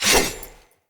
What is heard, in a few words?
Saw Dagger Throw, Weapons Swords; Player